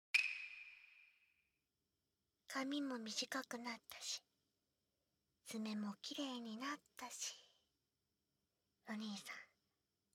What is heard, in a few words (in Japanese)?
耳かき, BGMなし, ASMR; お狐ちゃんの耳かき